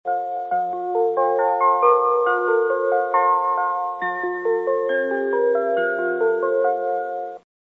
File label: chime; keio; ttc